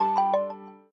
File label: Unlock, phone ui